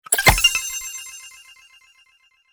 Complex tonal notification

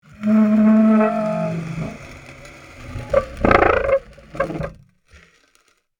Metal fence short squeaky movement; squeak sound effects

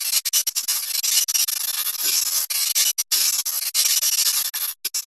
Electronic static and fizzle

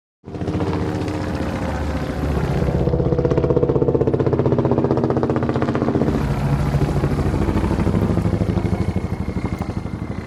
Military helicopter overhead aircraft flying away

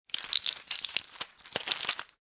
Foil blister pack handling and crinkle; medicine

